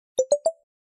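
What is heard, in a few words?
cablegram notification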